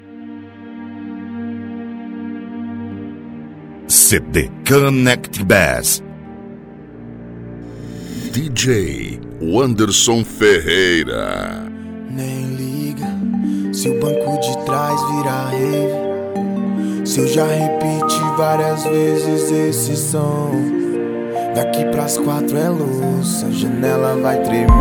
bass house e rap

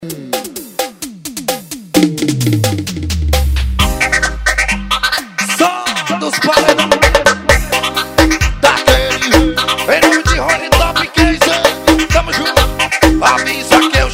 piseiro

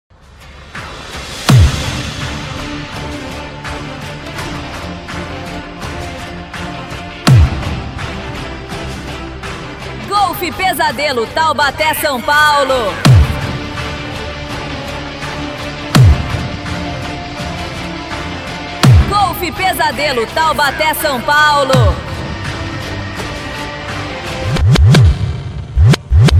funk frequencia; taubate sp

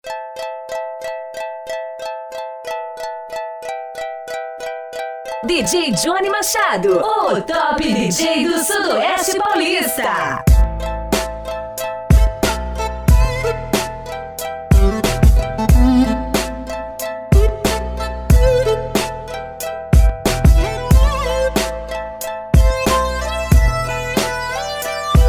hip hop; cd bass extreme

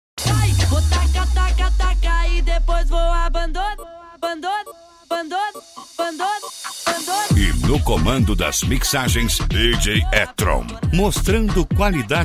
mega funk funk

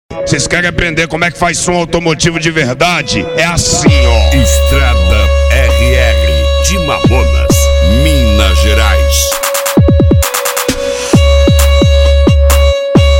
melo do violino; strada rr de mamonas mg

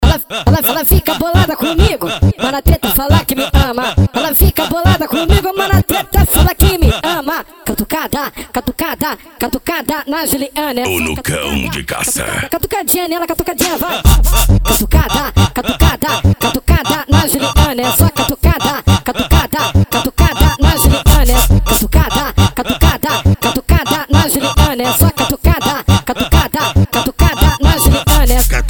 funk ritmado

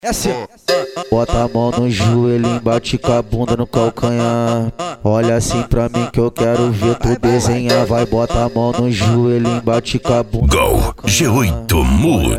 funk ritmado

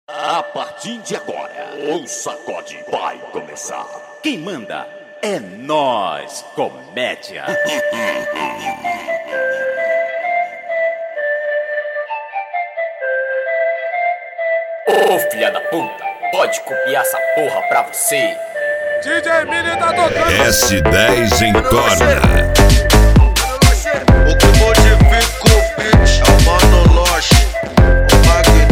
flauta; s10 entorna

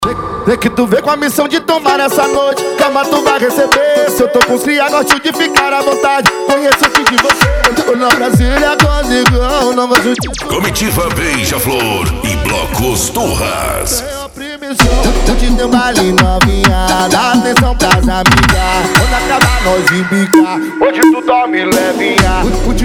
bloco carnaval; bloco os turras; comitiva beija flor

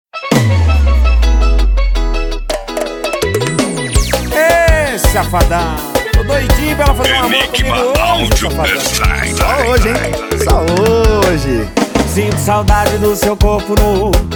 sertanejo

